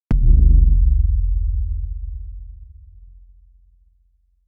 deep electronic percussiv; short decay

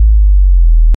negative feedback

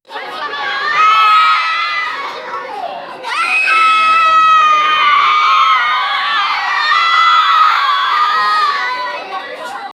schoolgirls playground

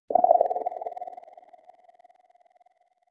smooth futuristic chill v, neon glow

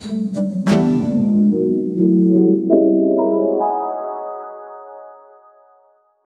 jazz glitch transition

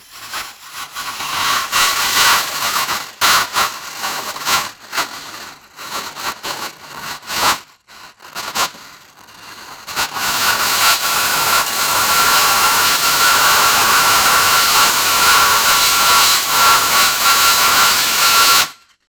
sound effect of a large fabric

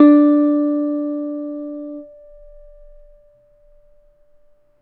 a clean dry audio recordi, musical instrument

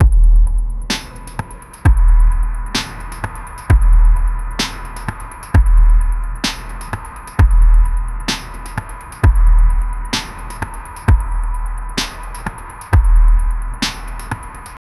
sparse electronic beats, build up

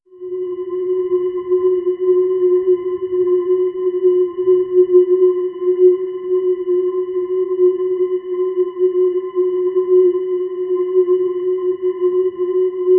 distant tension drone low

